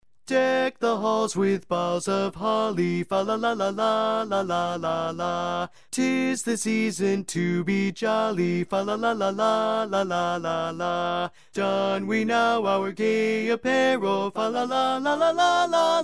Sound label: Deck The Halls Lead, Grand Chorus